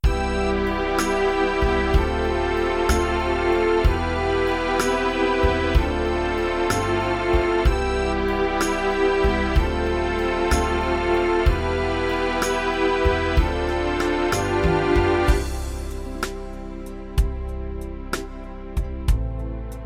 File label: 128 Ballad; mzx500; preview pack 01